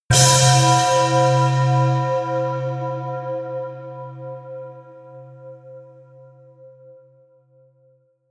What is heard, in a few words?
bell punch